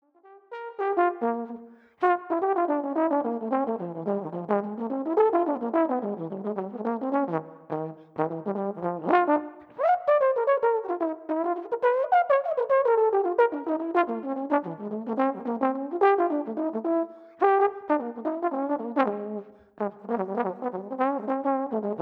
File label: Blues Etude